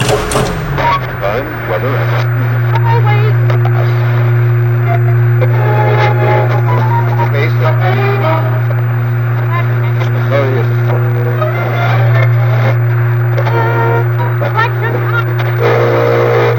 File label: pads; Muzik 4 Machines show 4 sept 2008